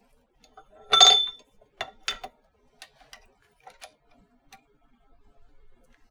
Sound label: Vice, Workshops